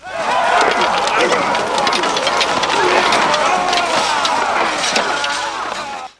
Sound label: battle